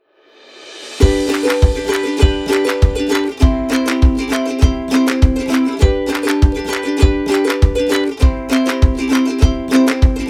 Holiday Ukulele

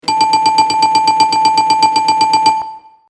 correct

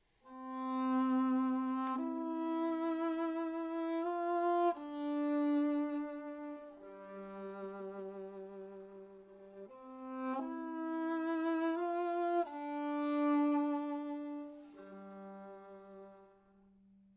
viola

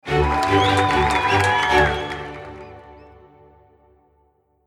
sfx victory

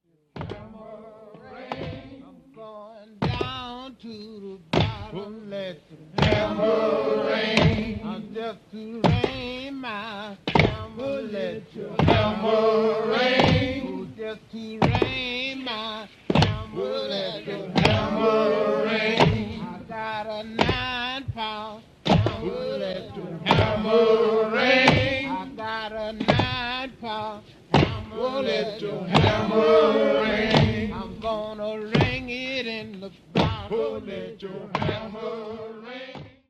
Work song

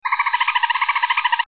dolphin

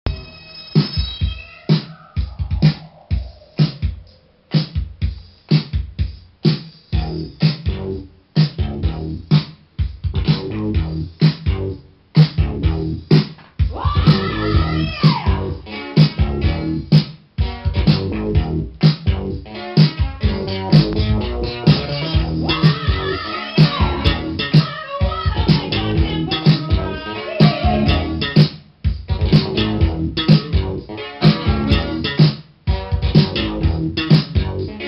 Killer Synth Break

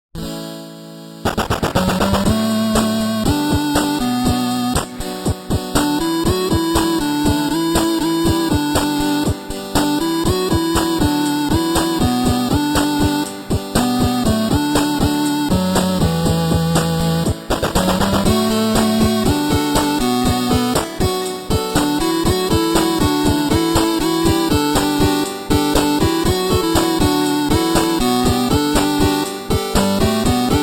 8 Bit; Tribute to Adventure Time

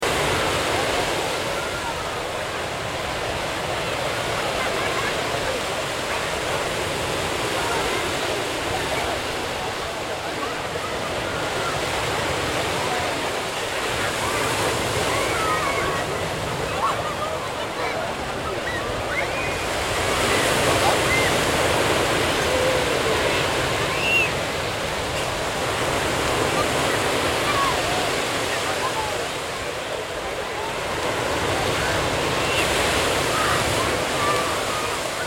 Sea Plage et mer

